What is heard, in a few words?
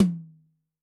TOM ntrl H, Tom; Yamaha DTXpress-II